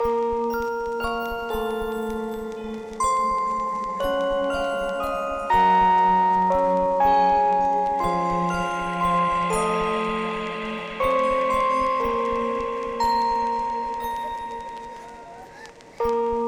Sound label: Creepy Music Box Theme 60BPM; Cinematic Trailer Music; Themesongs